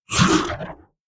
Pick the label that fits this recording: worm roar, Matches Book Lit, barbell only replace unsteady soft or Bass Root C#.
worm roar